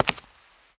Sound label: walk concrete